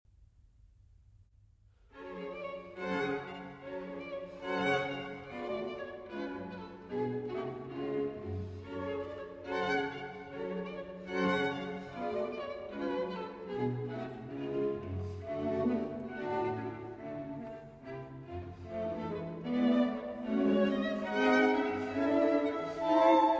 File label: String Quartet in A Major II. Assai Agitato